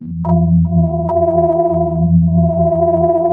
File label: Glitch Distress